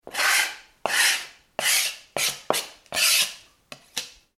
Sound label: household knife scrape plastic chopping board, Knife Scraping On Chopping Board; Knife Scraping On Plastic Chopping Board ( Some Room Reverb ); Food (Sound Effects:Household); McKinney Sound